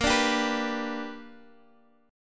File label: G Major Bb; G/Bb strummed